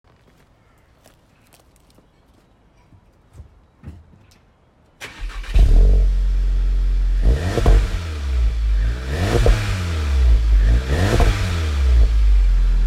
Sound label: vw gti